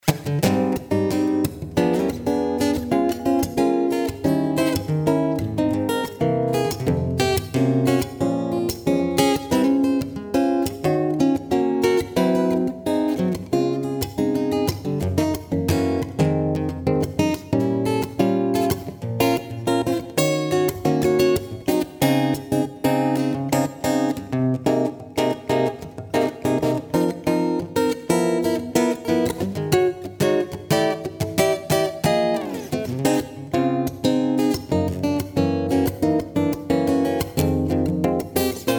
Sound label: guit